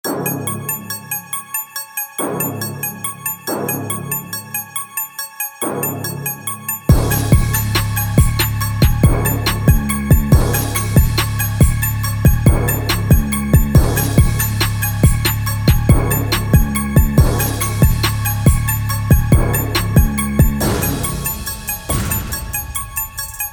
70Bpm

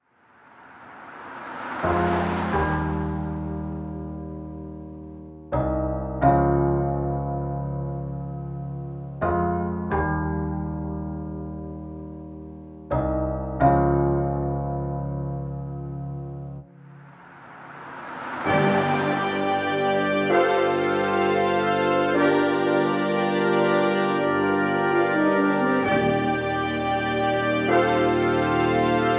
Instruments

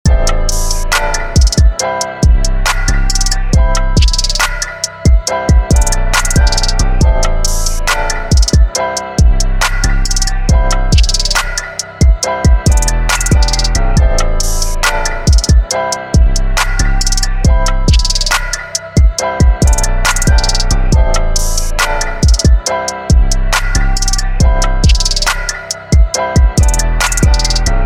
EPIC

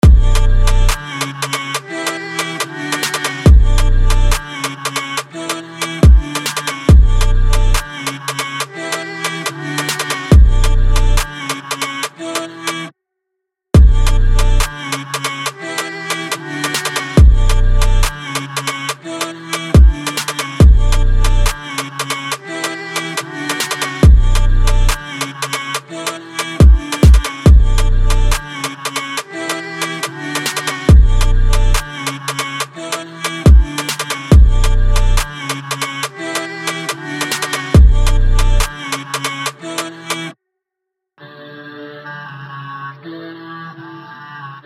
Drill

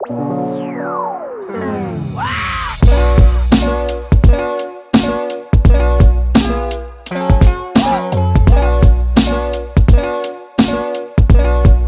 Vintage Hip Hop

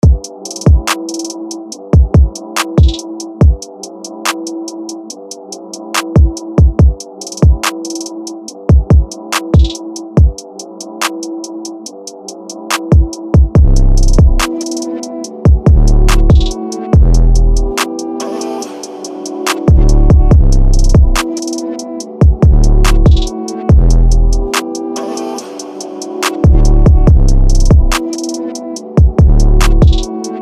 Beat

